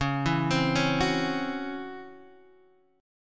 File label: C sharp Minor Major 7th Double Flat 5th, Guitar Half Step Down